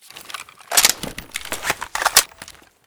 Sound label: abakan reload; STALKER; IWP